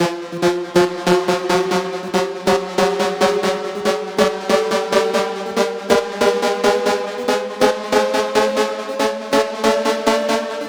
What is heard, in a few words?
140BPM Raiser; FX Fills; 40Bars; Vengeance Samples